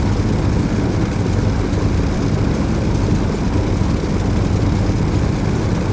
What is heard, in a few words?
Aircrafts Engines Packard Merlin Prop Cockpit Ex In; DCS